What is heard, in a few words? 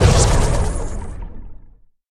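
power arcane deploy; smite; god merlin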